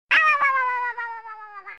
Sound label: owowowow, sms; ringtones